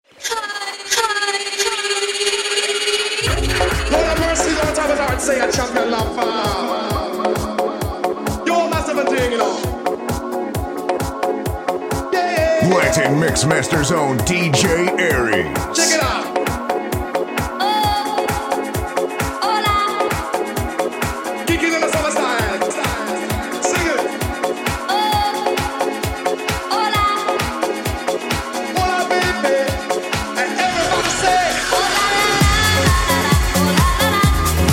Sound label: Latin House Mix